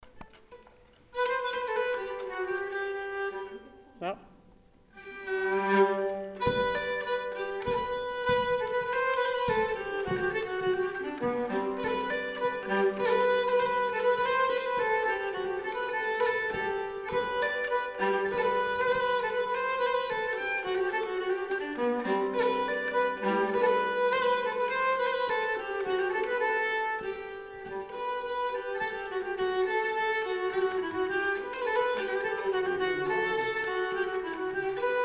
slängpolska; efter andreas

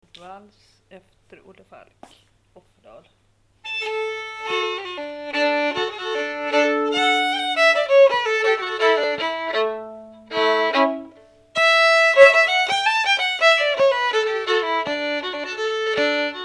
vals; efter olle falk

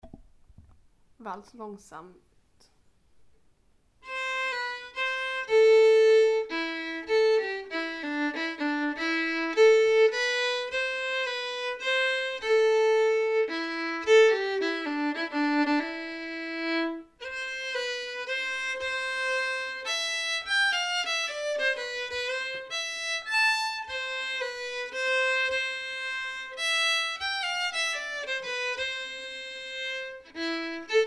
Andra Stämma Sakta

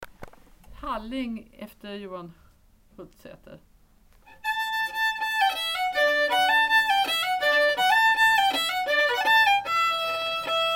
Halling; efter Johan Hultsäter

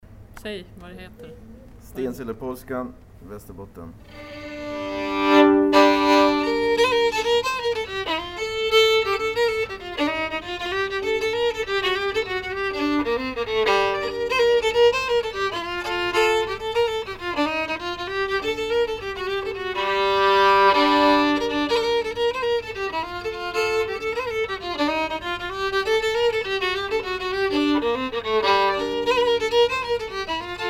Stenselepolskan

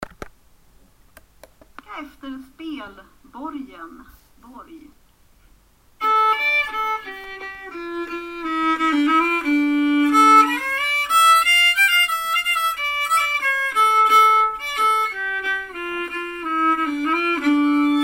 Polska; efter Spelborgen Borgen

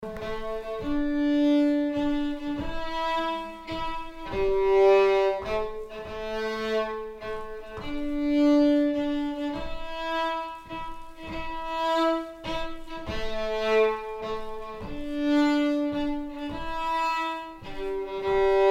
stämma skuv

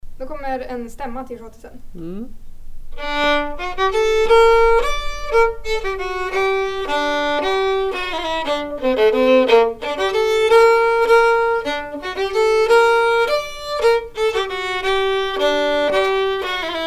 Stämma till schottisen

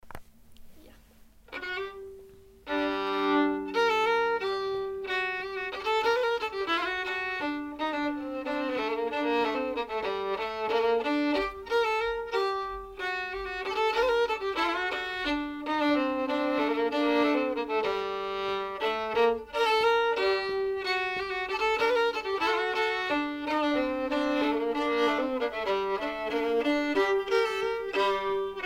Stämma; Apelkvist

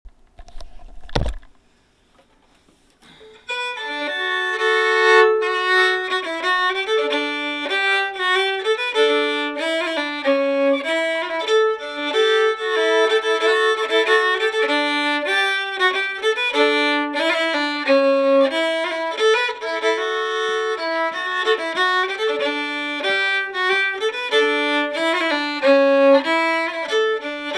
andra stämma

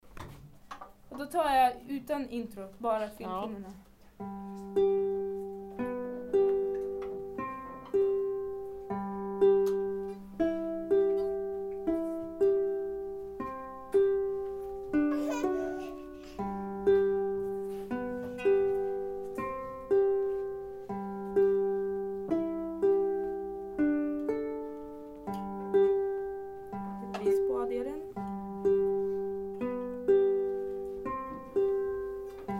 fiolknäpp

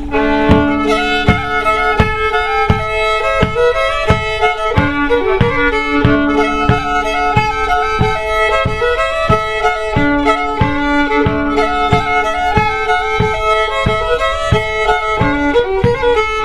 Snoa; efter Samuel Seidlits

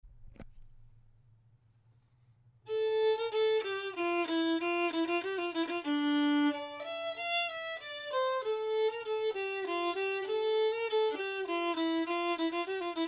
sörmländsk storpolska

